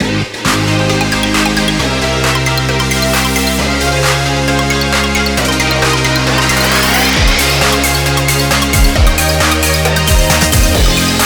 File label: Dawn tone